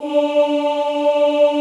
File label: OOH D3, Classical Choir; Best Service; Extended Classical Choir